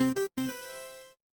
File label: feedback start